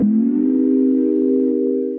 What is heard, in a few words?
Neo Soul Pad Tilt; Synth Pad; Ableton; Factory Packs; Synth Essentials